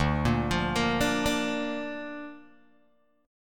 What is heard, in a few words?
D Minor Sharp 5th D Guitar Open G